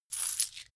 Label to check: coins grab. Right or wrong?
Right